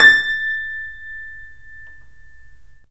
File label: PLUCKS, piano; InVision Interactive